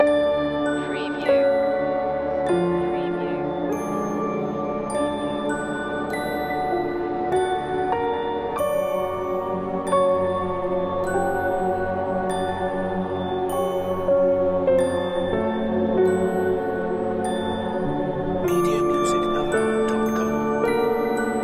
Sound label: evil music box long looping